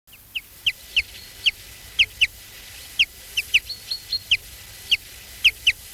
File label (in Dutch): vink, trekroepjes; tijdens de vogeltrek